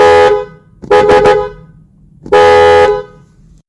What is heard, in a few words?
ford bronco sport horn